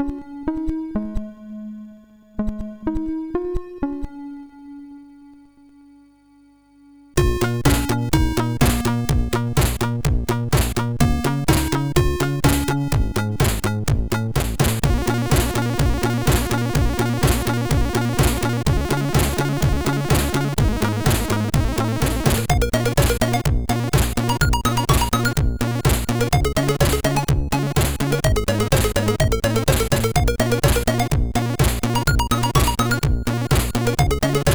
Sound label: MOS6581R2, sid